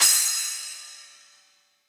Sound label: Splash 8 Cold Tight Room, Cymbal; Drum Hits; Standard Hits; Ableton; Factory Packs; Session Drums Studio